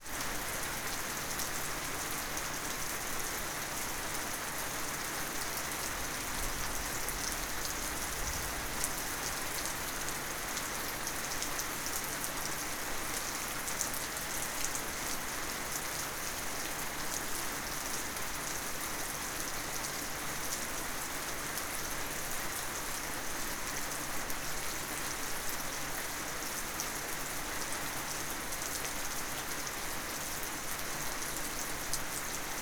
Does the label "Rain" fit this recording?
Yes